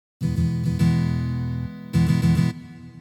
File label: Fill Normal